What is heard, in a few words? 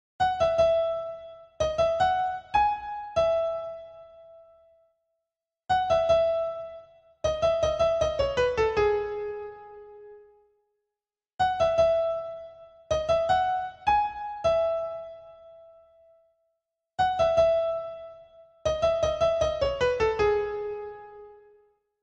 sad bgm; jailer